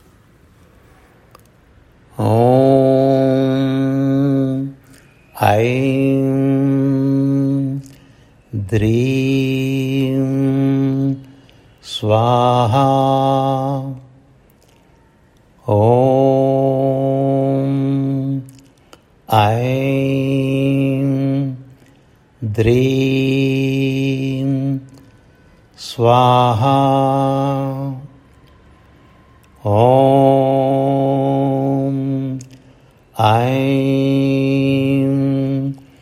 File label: om aim drim swaha